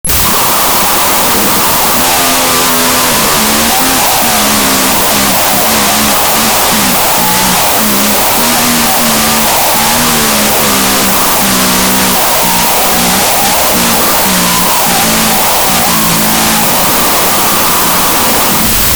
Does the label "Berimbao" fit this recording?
No